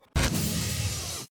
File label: modal close